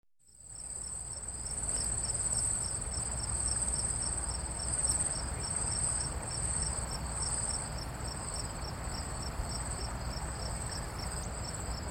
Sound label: Crickets at night city side